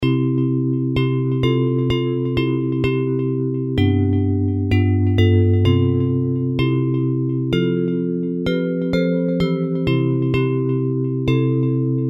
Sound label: Bells